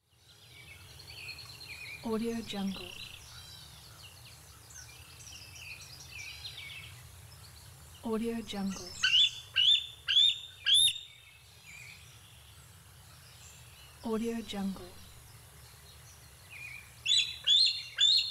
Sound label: wildlife forest ambiance